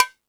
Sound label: Latin Layers, drum machine